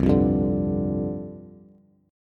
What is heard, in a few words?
C Suspended 2nd Suspended 4th C Bass; strummed; JGuitar